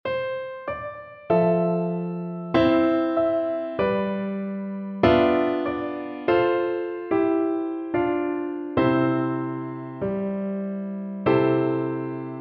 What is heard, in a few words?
this little light; Percussion